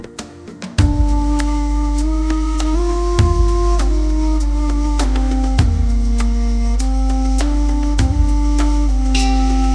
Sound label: tribal drums and flute, ode to joy; musiclm; melody conditioning